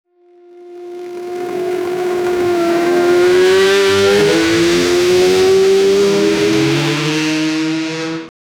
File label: racing bike hard